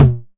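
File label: 909 mid tom; Goldbaby Tape808 & Tape909; The Tape 909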